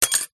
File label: glass shard from broken picture frame drop not break; foley